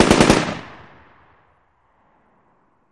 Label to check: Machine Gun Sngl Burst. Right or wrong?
Right